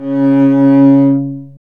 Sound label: STR ARCBS, Cb Bowed, mf vb; String Master Series